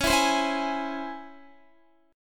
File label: Db Minor Major 7th Db down Guitar; 4F,4A,5C,5G,5C,6E; strummed